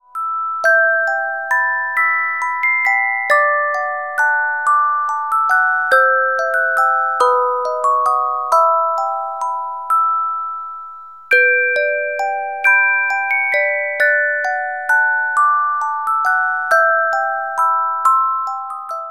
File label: Carillon Music Box Melodies